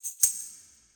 Tambourine One Hand Shot With Pre Sound

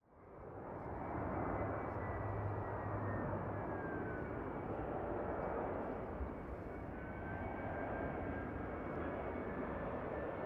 Airplane Flyover Landing Heathrow

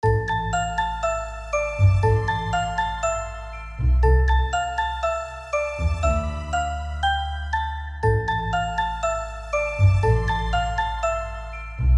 Spooky Halloween Soundtrack

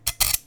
Kitchen

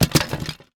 Wood debris fall

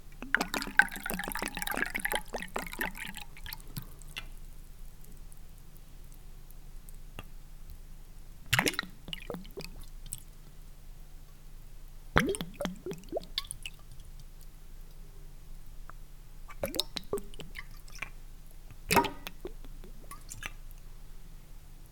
Pouring The Vodka

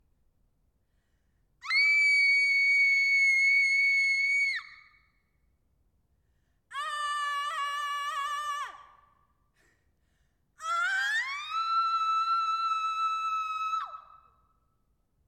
Girl Scream

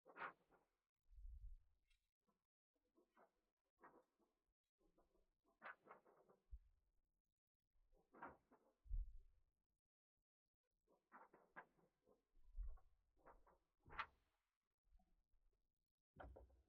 Paper Blowing

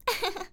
Girl Laughing

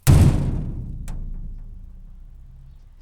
Metal Gate Closing

